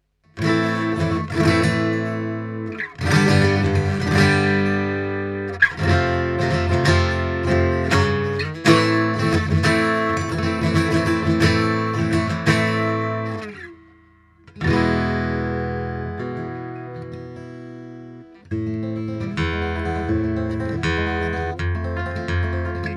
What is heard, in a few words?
Gibson J-45, guitar